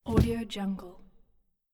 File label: old sailboat heavy hemp rope drop